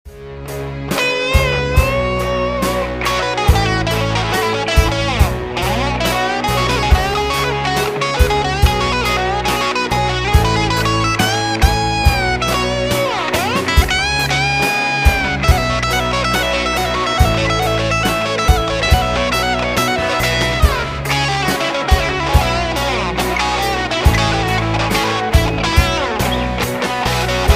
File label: Essential Elec Blues And Minor Licks